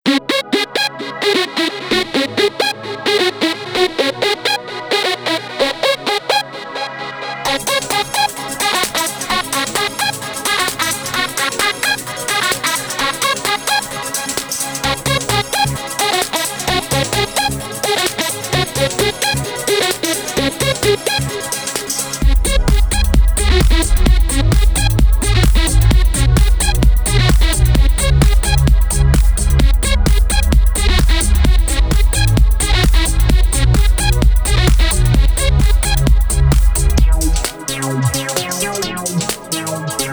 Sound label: techno trip 432 hz